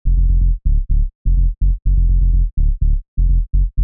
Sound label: Bass midi Techno House